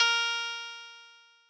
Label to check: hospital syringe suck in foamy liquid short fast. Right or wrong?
Wrong